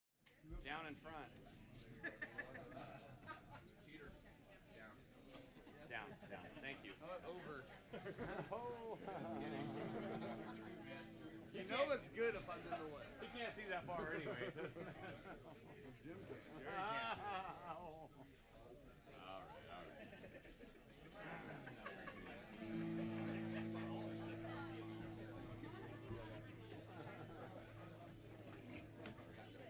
tuning

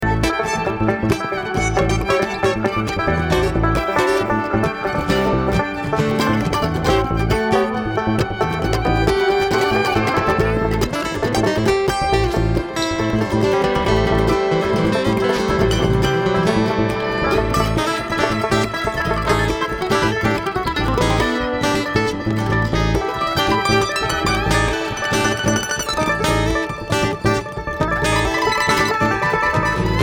Traffic Jam

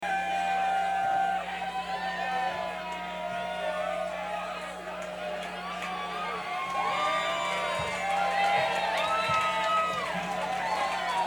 Crowd

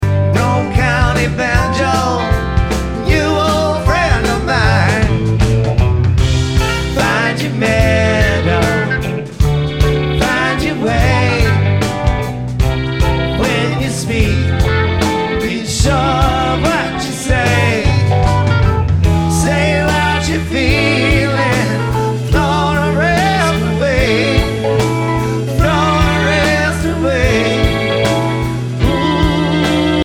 Banjo

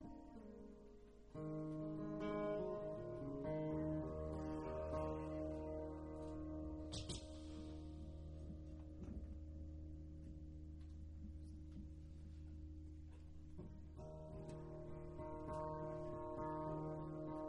Tuning Raga

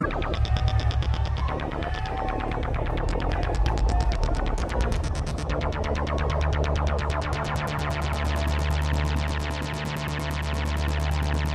Moog Solo

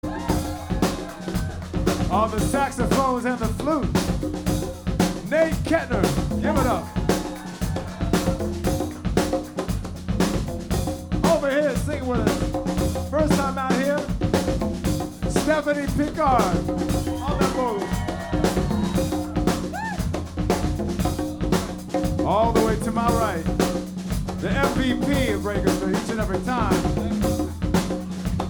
Break Drums

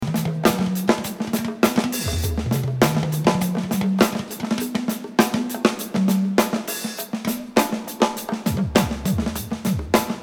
Drums and Bass

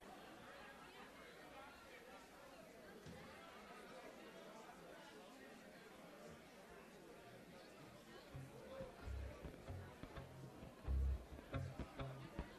checking tuning

